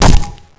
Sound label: hit body, crowbar